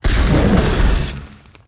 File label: Controlled Burn Timber Chain